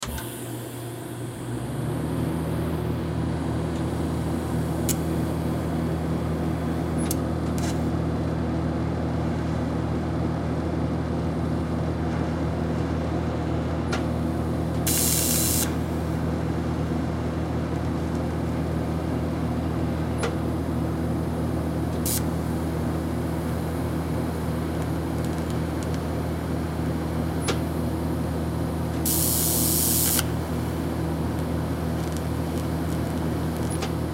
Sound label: Arc Welder On Off, Arc Welder On Run Off Generator Sparks; Welders; Machines; INDUSTRY; Arc Welder On Run Off Generator Sparks Halyard; Blastwave